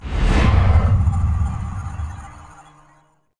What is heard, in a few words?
Fail General Hit; Assassin's Creed Bloodlines; psp